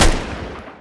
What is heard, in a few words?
leopard mg; weapons